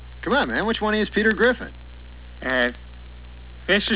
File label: death laugh